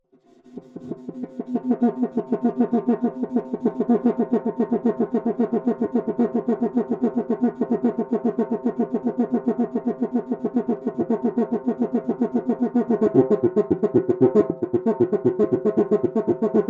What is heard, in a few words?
Cuica; Percussion Samples